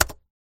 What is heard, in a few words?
keystroke